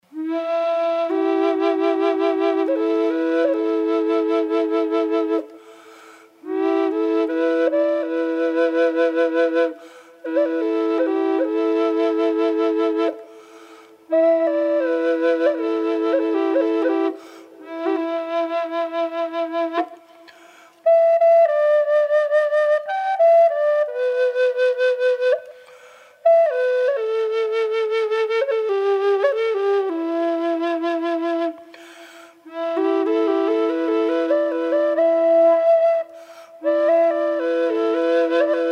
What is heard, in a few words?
Spalted Em Drone Wet, flutes; drones